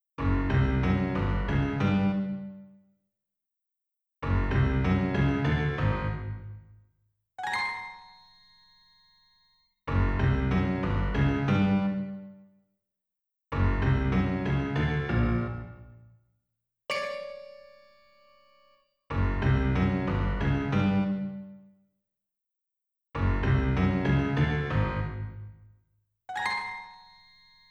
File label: Piano; kuro no juusan gamerip